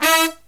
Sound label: HI CHORD, Brass